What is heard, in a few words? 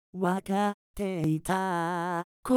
Melisma